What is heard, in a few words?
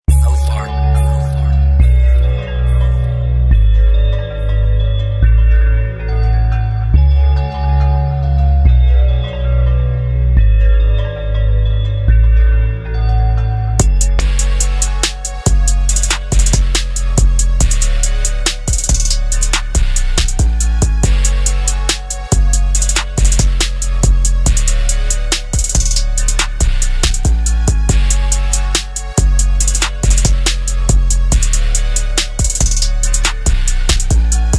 Instrumental